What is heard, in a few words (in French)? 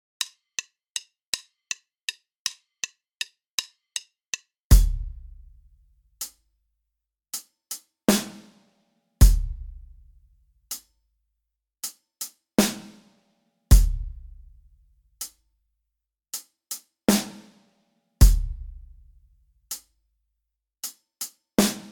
decalage triolets exercice batterie tempo 080